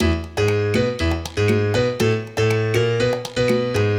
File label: piano, loops, phonky